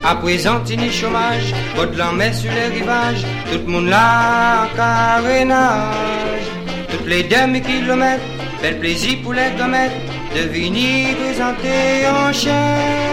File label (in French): musique merengue; Conférence audio Laméca